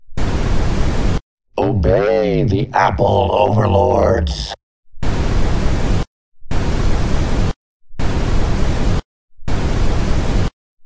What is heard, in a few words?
White Noise